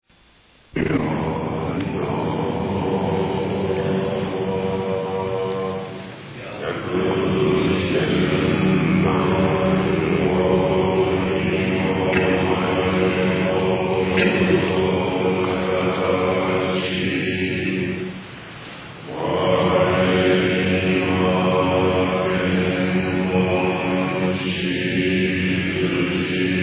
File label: chant